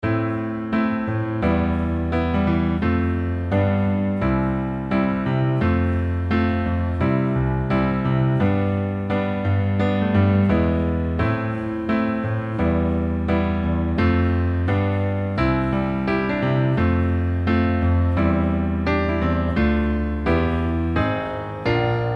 add9 form arrange B

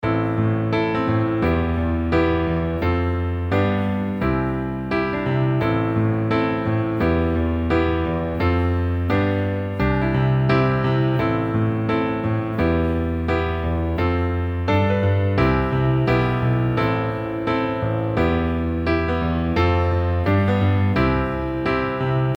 add9 form arrange sabi

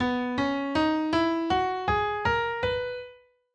Major Scale B

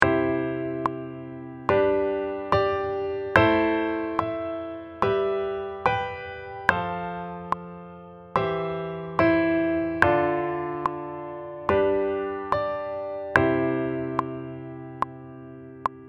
chordtone mix, accompaniment pattern

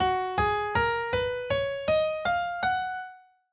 Major Scale Gb

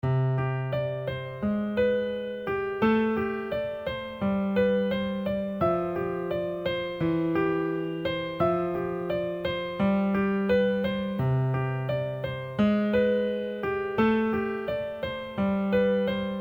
LH arrange

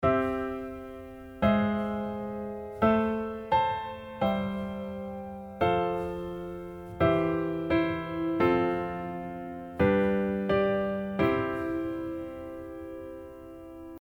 inversion approach

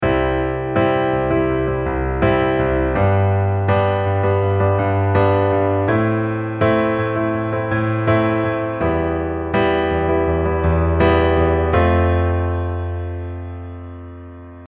shake beat, accompaniment pattern

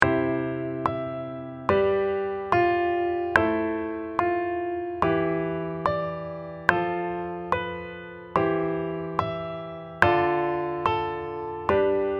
approach note, accompaniment pattern

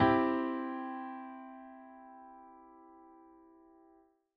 triad C major

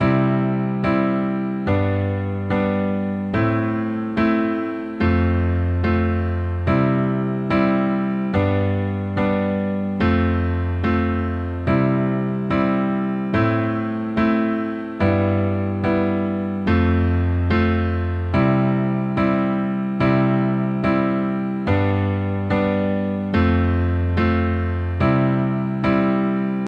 LH bass, accompaniment both hands

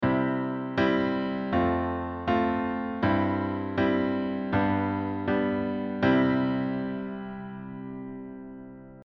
inversion, accompaniment inversion

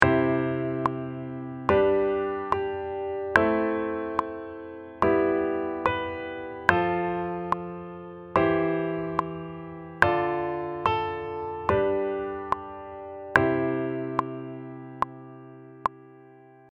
chord tone, accompaniment pattern

